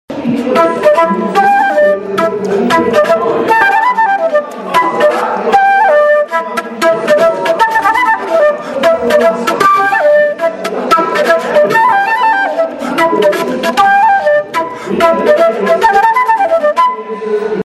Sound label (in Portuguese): Flauta Transversal Vinheta Cantada